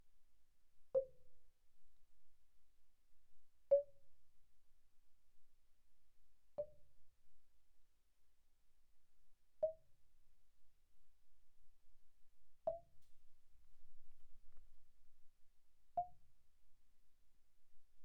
Marimba deadstroke pp C5 B5; Percussion

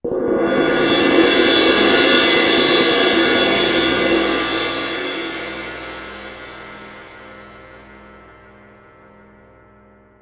crash mallet roll, Suspended Cymbals; Percussion

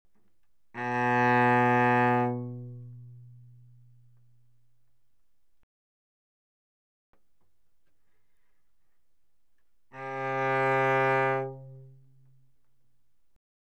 Cello arco mf sul G B2A3; Strings

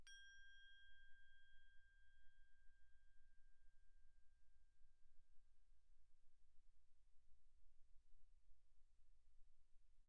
crotale G6 pp; Percussion; crotales

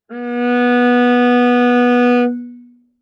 Viola arco ff sul C B3 stereo; MIS Pitches - 2014; Strings

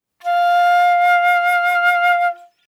Flute vib ff F5 stereo; Woodwinds

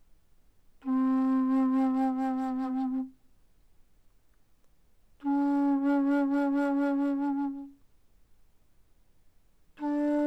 Bass Flute mf C4 B4, woodwinds

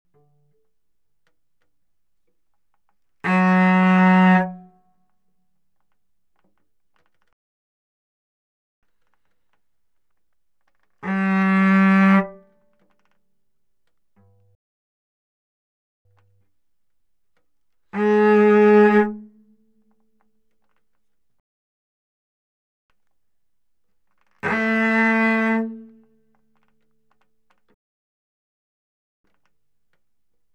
Cello arco ff sul C Gb3Db4; Strings

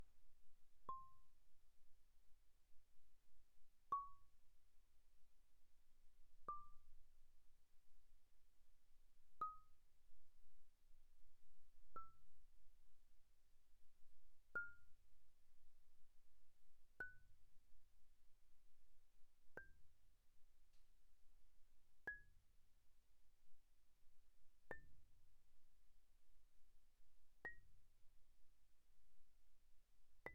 Marimba cord pp C6 B6; Percussion

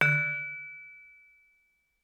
Vibraphone dampen ff D3 stereo; MIS Pitches - 2014; Percussion

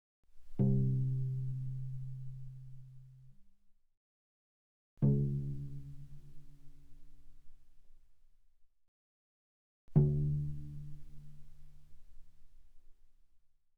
Cello pizz mf sul C C2 B2; Strings

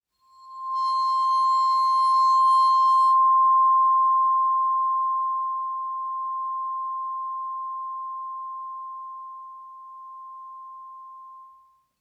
Vibraphone bow C6 stereo; MIS Pitches - 2014; Percussion

